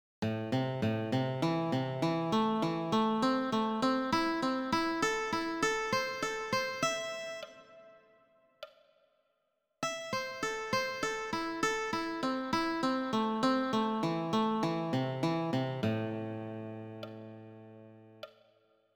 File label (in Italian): arpeggio A minore